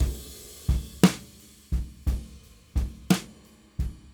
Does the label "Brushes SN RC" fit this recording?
Yes